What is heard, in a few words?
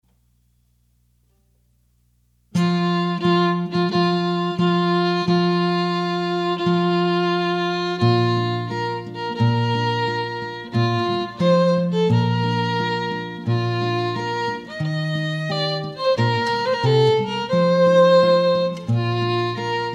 bridals chorus